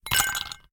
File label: ice chunks, notifications; Doov